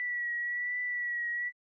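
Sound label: radar warning receiver; PC Gamer IT CD 47; USAF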